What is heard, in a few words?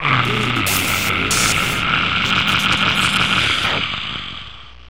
zombie idle; custom monsters; glitchzombie